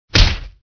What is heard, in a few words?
punch